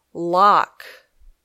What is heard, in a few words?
lock